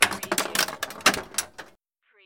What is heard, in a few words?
Pinball sound, ball being knocked between several objects